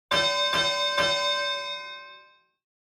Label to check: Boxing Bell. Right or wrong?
Right